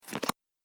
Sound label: Cards Card Flick; casino; Card Sound Effects